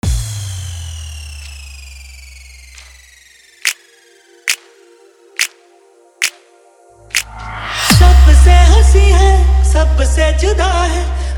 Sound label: Hard Bass Dance Mix